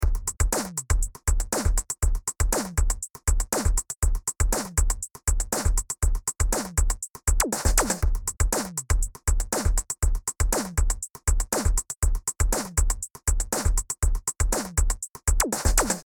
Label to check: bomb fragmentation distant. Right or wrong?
Wrong